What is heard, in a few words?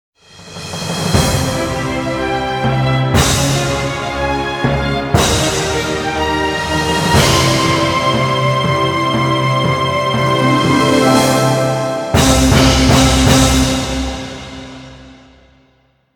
Mario Fanfare, An arrangement of "Course Clear"